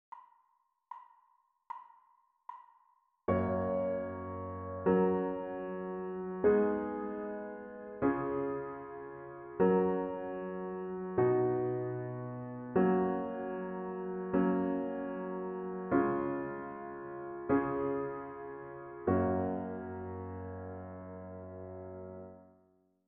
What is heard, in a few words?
harmonic dictation